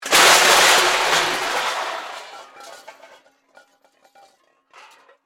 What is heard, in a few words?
Impact Metal Crash; Crashes; Objects; BlastWave